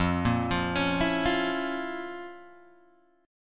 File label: F Major 7th Suspended 4th Sharp 5th F, Guitar Open G